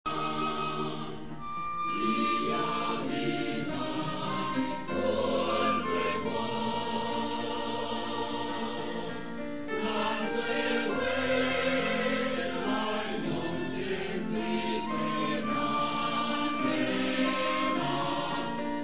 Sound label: Choir; taiwanese